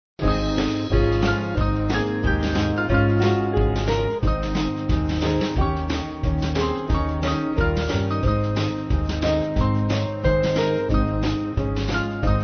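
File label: Band, Music Backing; Have You Any Room; Williams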